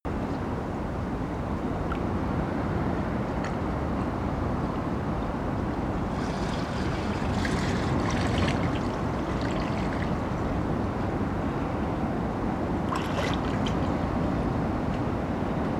surf small stormy sea

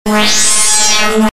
energizing event

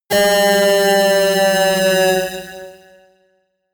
bass drop slow short

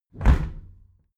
window close heavy

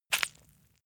leather impact mud soft squeeze

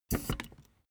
office chair hydraulics down stepwise

